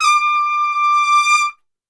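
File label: D 4 trumpet; Best Service; Brass Super Section; TRP SWL; 90 s Sample CDs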